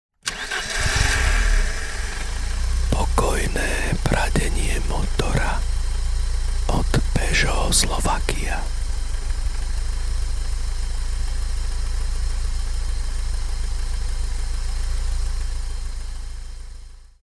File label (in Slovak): pokojne pradenie motora peugeot